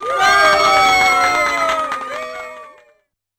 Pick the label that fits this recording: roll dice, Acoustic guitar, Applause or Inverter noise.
Applause